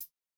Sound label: no effect j city pop 1980 hihat